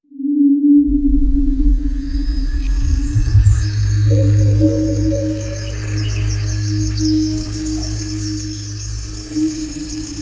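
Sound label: 3d spatial jungle soundscape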